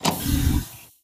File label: game show quiz open door